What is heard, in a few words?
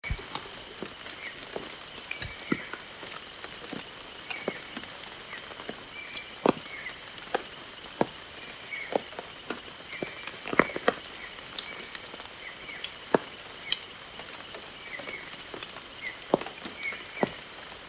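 Allobates granti, frog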